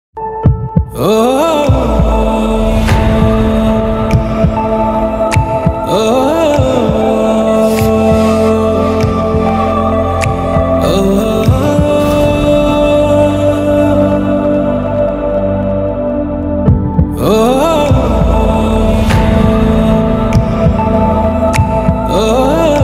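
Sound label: background music ringtone; hridayam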